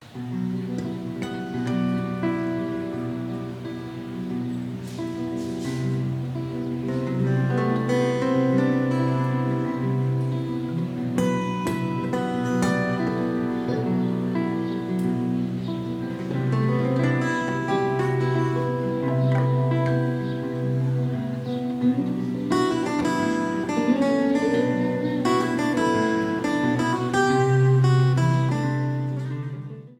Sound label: guitar